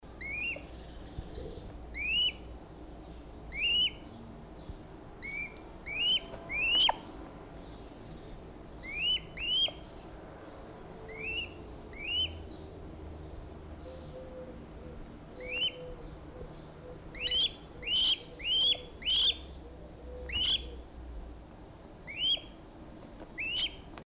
athene noctua

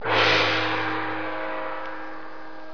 musical

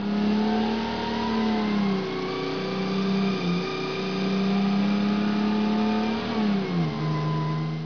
emergency

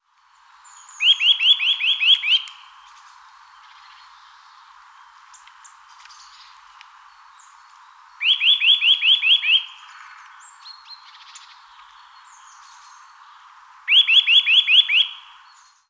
sitta europaea

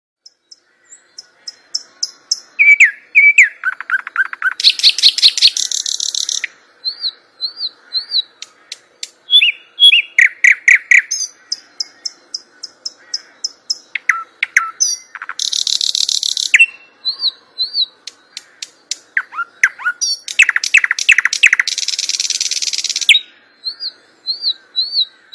thrust nightingale

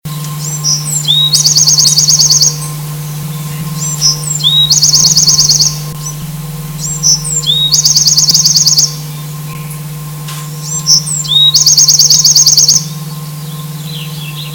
bewicks wren

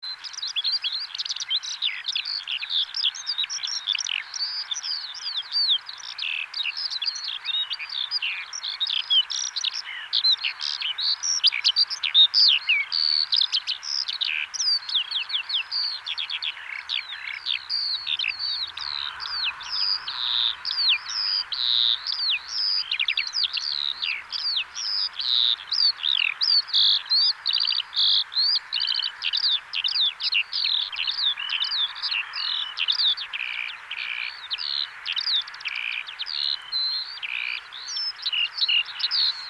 alauda arvensis

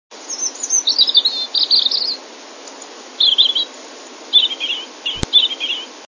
robin

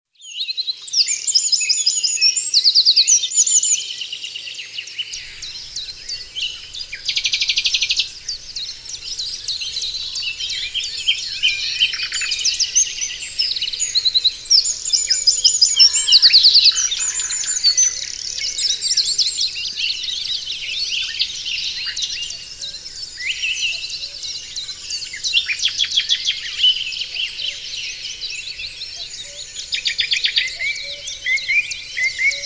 dawn chorus

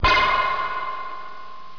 scifi

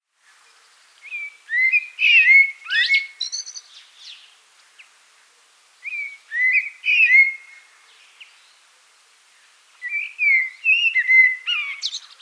blackbird

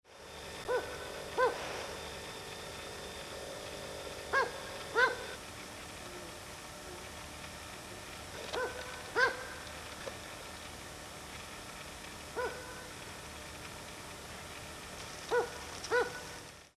strix uralensis

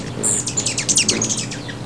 rufous

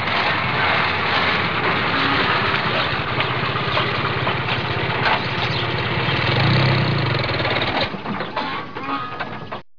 trucks

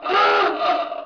nautical

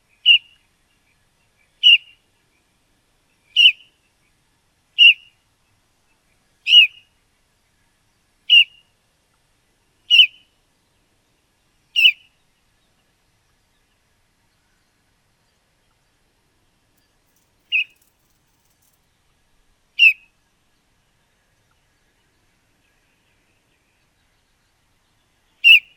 red whiskered bulbul juv

